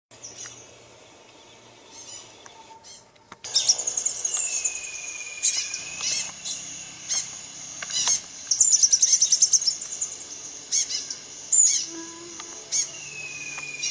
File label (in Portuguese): canto da saira ferrugem